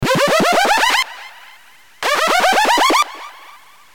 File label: SMS Ringtones